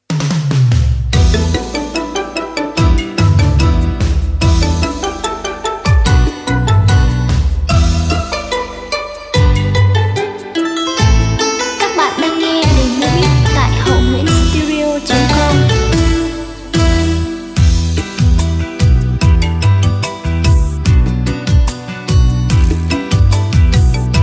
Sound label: beat, playback